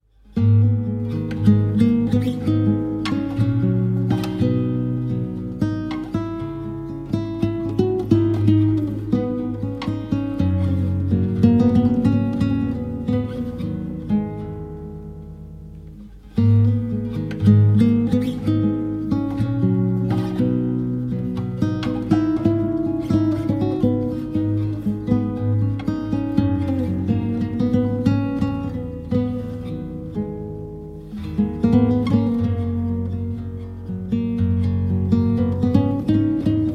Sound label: Latino Song Guitar